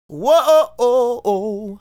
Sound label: Male Solo, Hip-Hop and RnB Vocals